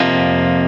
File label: Arp. Esus4